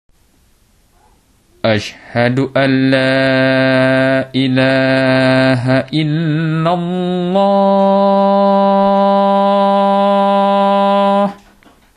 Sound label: Adzan